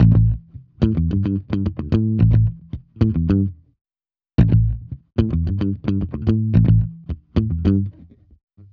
110 bass loop A7

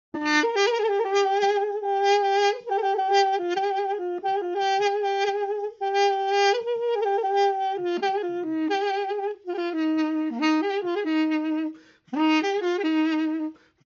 duduk

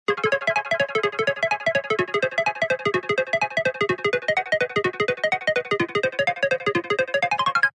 water synth, synth arp loop water C#min

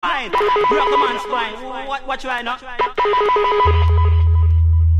Gunshot Wheel Up; DJ

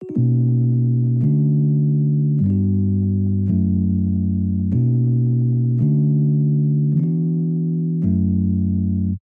piano

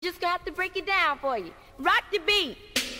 Ghetto Style Vocal

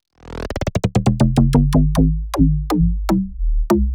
bass loop weird house 120 Emin

